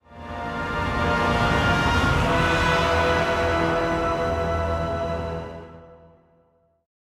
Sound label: Stinger